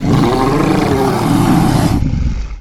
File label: Ironhide Runt Death